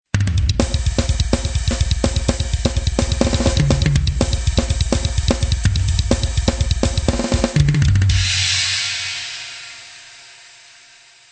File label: fireball